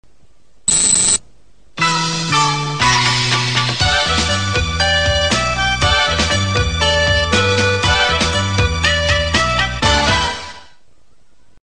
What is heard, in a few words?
quiz jingle instrumental karaoke; atsumare goku world